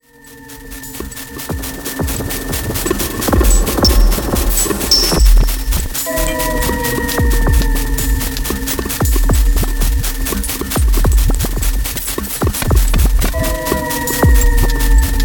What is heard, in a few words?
Rooster Beats